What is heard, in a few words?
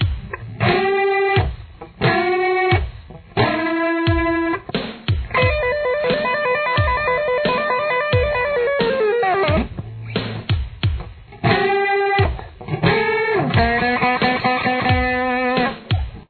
solo, guitar